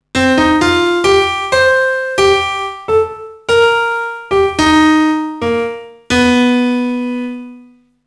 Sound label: Piano Play, manor; gamerip; max payne